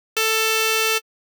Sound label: Wavetable Saw PHASE MOD Random; Pigments